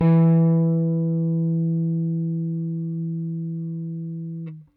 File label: RickThumb-B, RickenbackerBass; Airwindows